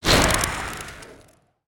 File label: Ice Shuriken Throw; Spinjitsu